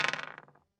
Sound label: dice roll; Cards & Board